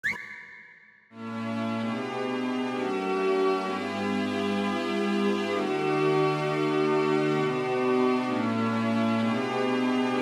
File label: strings; Pony Fan Music Remix Stems